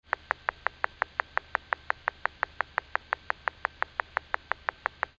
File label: white beaked, sounds of the sea